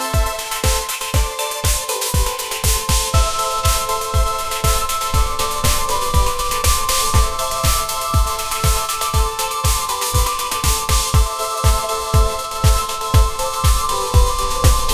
pop; ai-generated

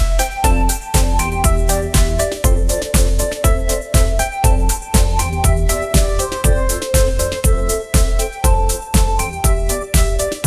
upbeat pop melody; ai

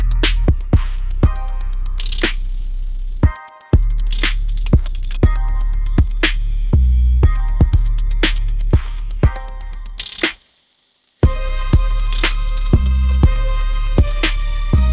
upbeat pop melody

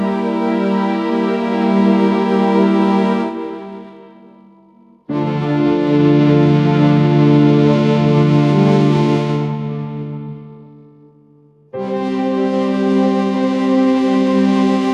orchestral strings

